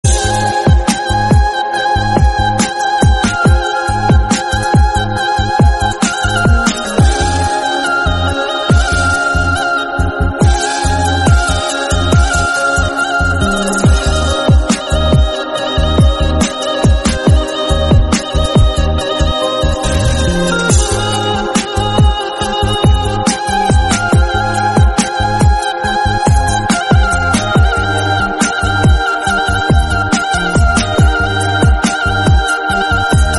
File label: painful departure music, bgm